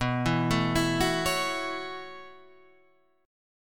B 9th Suspended 4th B down Guitar Half Step Down; B9sus4

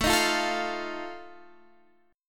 Eb Suspended 2nd Flat 5th A

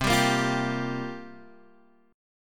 C 7th Suspended 4th, Guitar, Standard; C7sus4 strummed

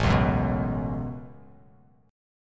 A 6th Add 9th A down, chord; A6add9; strummed

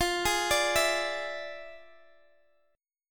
E 7th F, guitar; E7/F; arpeggiated

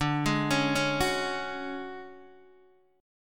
D Major 7th Flat 5th D down Guitar Open D

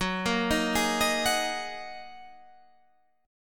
Gb 6th Add 9th Gb down Guitar Half Step Down; Listen to Gb6add9 arpeggiated

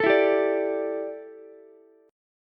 D Suspended 2nd Suspended 4th D down Ukulele; strummed

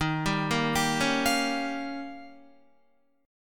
D sharp 9th, Guitar; D#9 arpeggiated; JGuitar

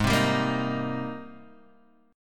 Ab Major 9th Ab Guitar DADGAD

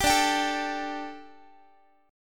D Suspended 4th D, Guitar; strummed; 22,22,22,19,x,x

